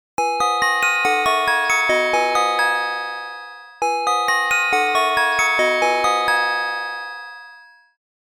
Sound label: bell; opxpro